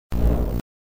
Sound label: Glitch